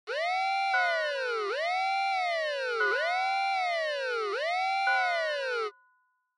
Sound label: Fire Truck Siren Fake Siren